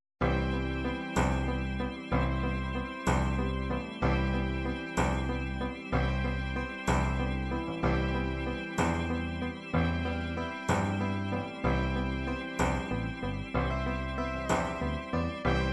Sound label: ballads ballad, midi